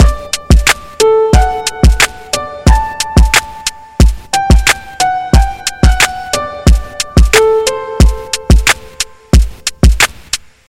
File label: Rockin Piano